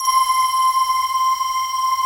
METALITES, pads; Pro-Rec - Synthsonic

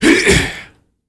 HIEHH, World Voices, Afro noise; ILIO; Vocal Planet VOL-5

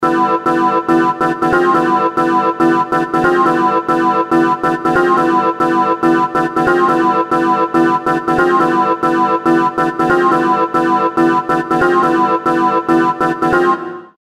Fairground, chords; Industrial

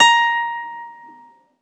ZITHER; Alpine Volksmusik

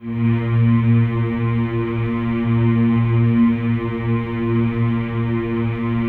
choir A#2; Spectrasonics; Symphony of Voices

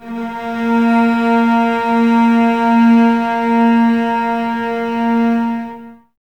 Cellos A#3, String Ensembles, No Vib; Miroslav Vitous

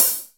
OPEN HH; USB Soundscan vol.51; AKAI

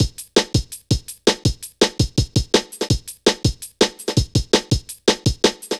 Drum Loops, Jungle

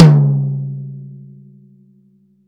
TOM, Drum Dry; East Collexion; Drum 1

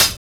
Snare, Drum and Bass; EZ Rollers; Instrument Samples

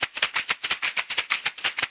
Percussion Loops, Shaker & Tamb; Houseworx

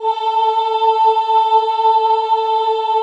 CHO A3, CHO Ooh; Sound & Vision; Gigapack I CD 2

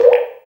FX Dance

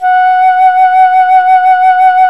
FLT FLUTE, Flt vib; Roland L-CDX-03 Disk 1

